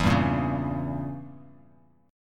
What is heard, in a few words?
E Diminished 7th E, Bass Standard